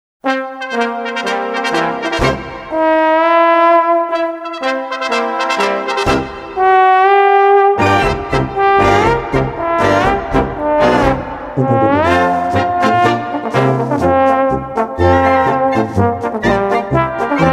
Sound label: Glissando Polka